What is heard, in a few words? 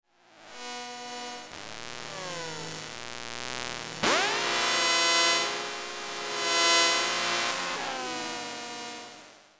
Robot vacuum electromagnetic textures